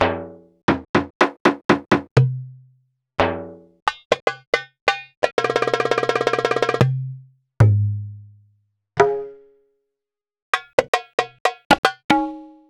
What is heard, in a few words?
Roland BK-5 Percussion